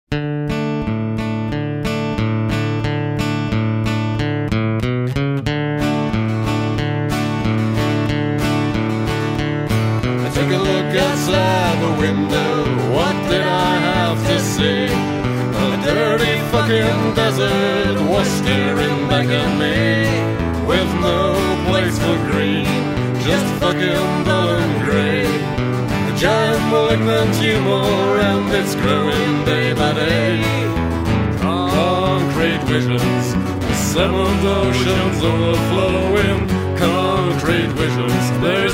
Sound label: country punk